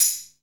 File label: TAMB, TAMBOURINE